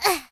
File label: bump; talking tom gold run gamerip 2016; Ginger